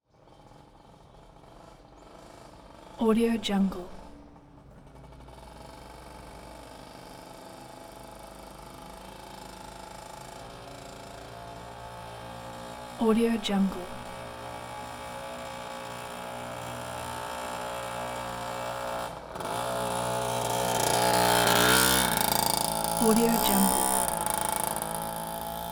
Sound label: 1979 cimatti moped funky 50cc; prosoundfx